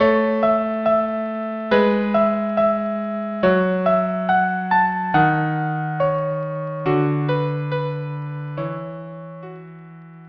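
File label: violin viola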